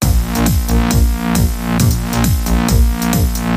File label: Sting